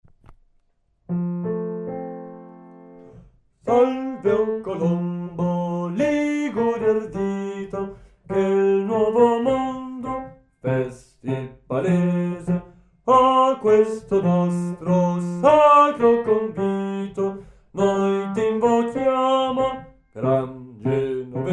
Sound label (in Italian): Salve o Colombo basso